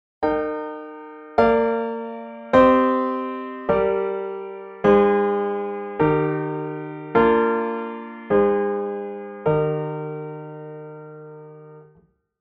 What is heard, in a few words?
17 prog piano a, 17-ET